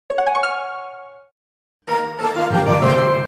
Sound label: clash royale start up sound; Memes